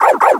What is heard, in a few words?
bark; DS; ML BOWSERS INSIDE; Broggy